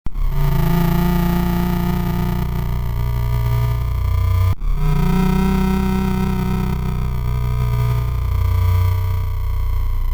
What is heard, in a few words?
morphotron cat pitch